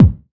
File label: BD, BASS DRUMS; EdgeSounds